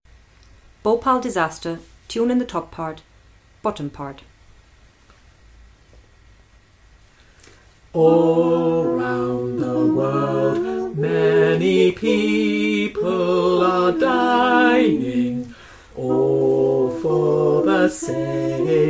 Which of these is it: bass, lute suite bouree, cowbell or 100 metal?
bass